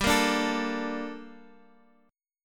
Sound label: G Minor Add 9th; strummed; madd9:1,b3,(5),9